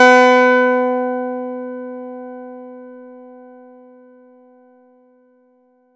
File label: Chord; ModelCycles